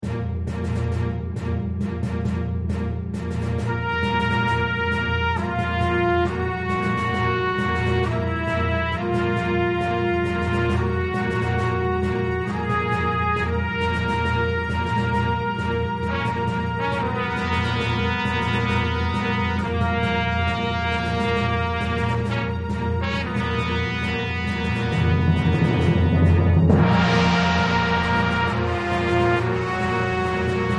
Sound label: Battle Theme - Alternate